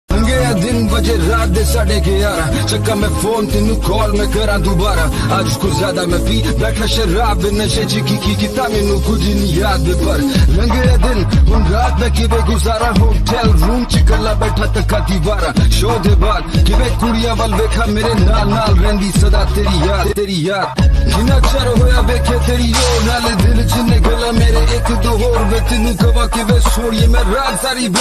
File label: punjabi ringtone